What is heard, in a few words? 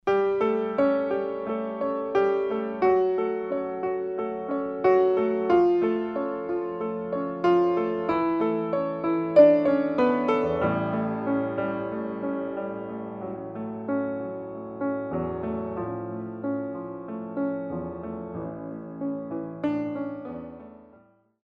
Eli KM 140 piano hi